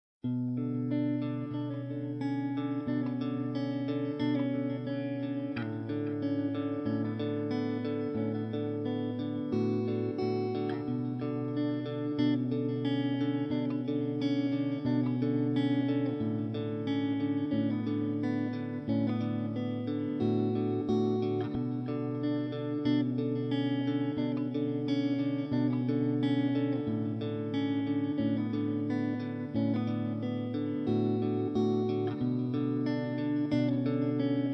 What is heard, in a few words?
gtrs, stems